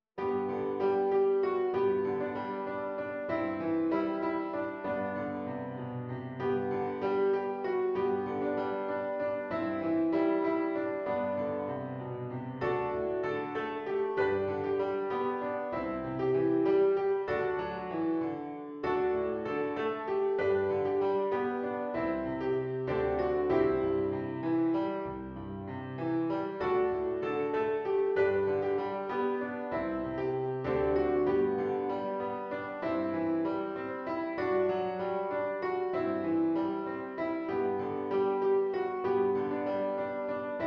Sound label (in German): Klaviersatz; Cramer